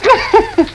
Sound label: Laugh; philadelphia